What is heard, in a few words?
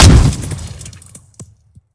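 weapon fire player; gmod; weapons; iw8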